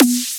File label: Electronic Snare Drum